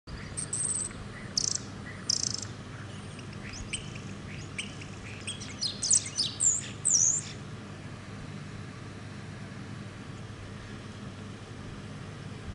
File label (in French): Chant de l etourneau sansonnet sturnus vulgaris faroensis